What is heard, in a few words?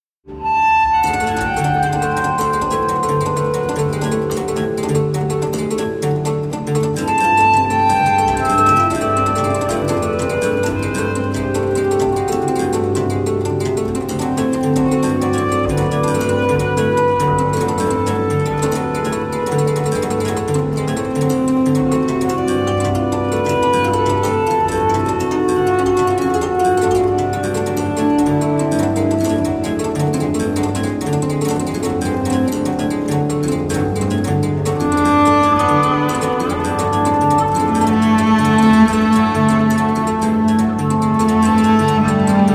harps